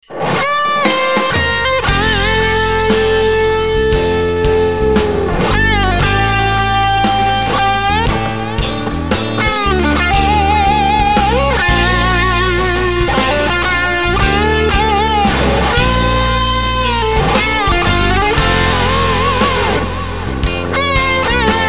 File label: bend solo